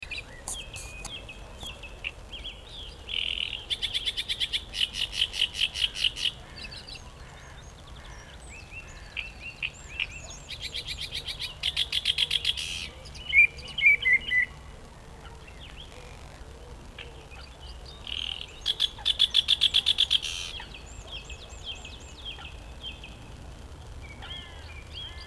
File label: Great Reed Warbler, Ilkeston